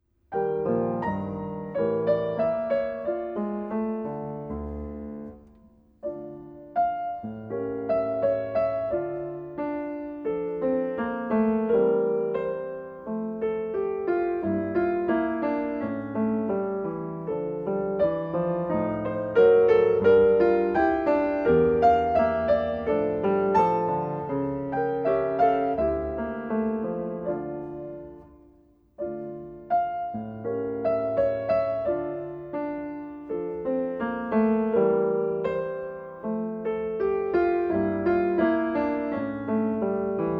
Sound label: Rises